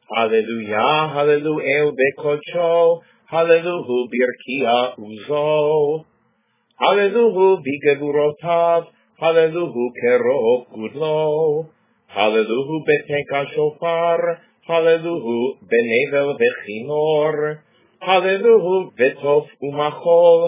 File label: PSALM 150